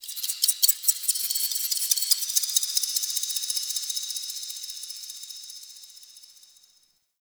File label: High Texture, Ambience; Warframe; The New War; Zariman